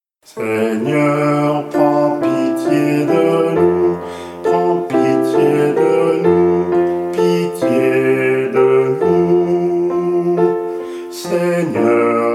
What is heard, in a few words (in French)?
preparation penitentielle basse; seigneur prends pitie; messe de l'agnus